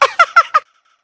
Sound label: hunter pounce; catnap n grabpack; elmo